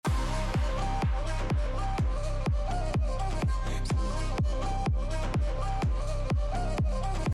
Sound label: flute; eurovision moldova